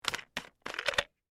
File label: Lid Open Plastic, Foley, Lid, Open, Plastic, Jug, Unscrew; Lids; Objects